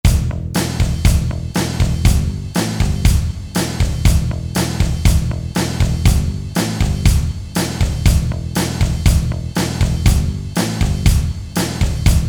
Thrash

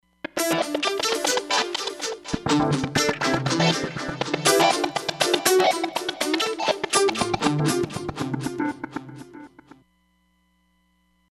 GTR Heroes

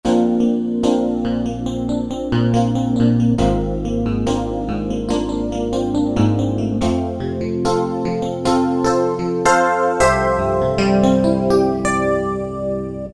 Xmod Attack E Piano, Roland PN-D50-02

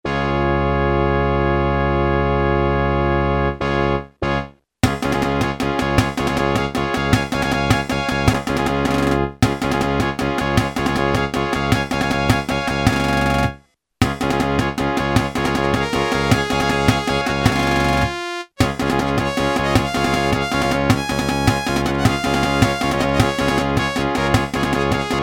FANFARE, Yamaha SHS-10 Factory style demos

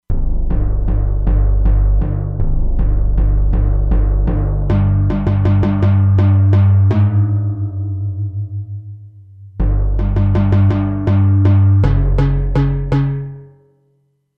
Timp w-Tone, E-mu Proteus-2; Factory Presets

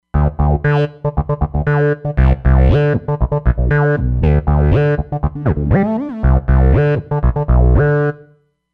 Plastic 3 Bass, Roland Fantom XR

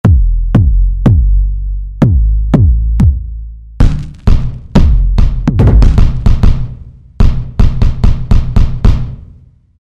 Roland V-Synth Kick; Factory Patches; 249 Kick Menu

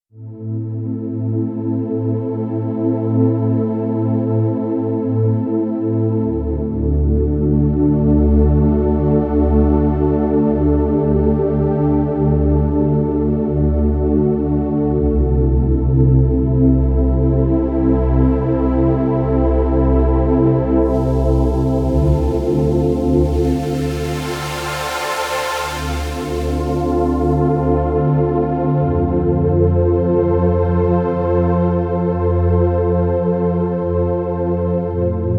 Warm Pad, Korg Radias-R

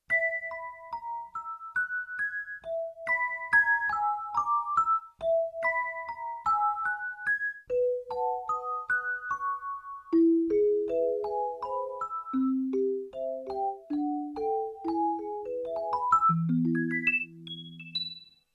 Chorus Celesta, Yamaha TX16W

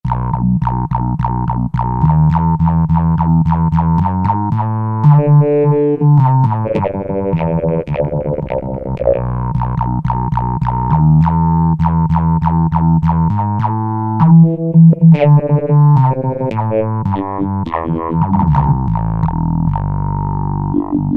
HUMAN BASS, Roland SC-880; Factory Patches

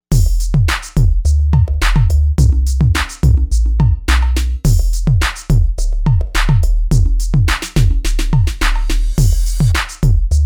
POPLOCK, AKAI XR20 Factory patterns

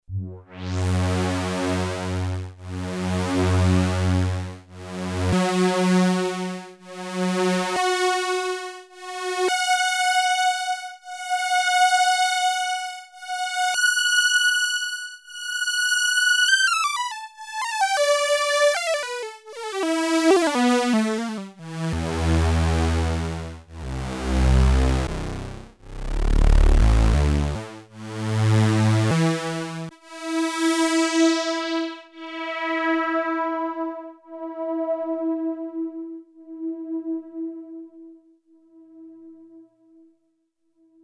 STEREO SPIN, Oberheim OB-8; Factory Patches

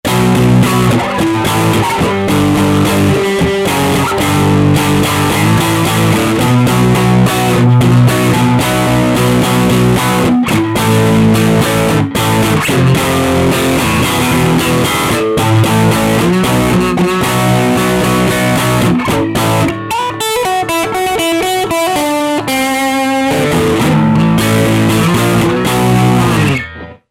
ROCK DRIVE

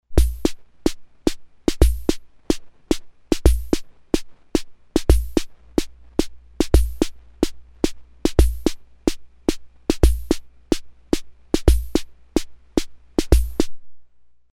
SHUFFLE, Ace Tone Rhythm Ace FR-2L

